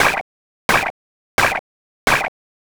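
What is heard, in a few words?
footsteps sand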